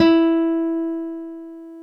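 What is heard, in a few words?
GTR E2 AC; Synclavier Sampler Library; KEYS AND GUITARS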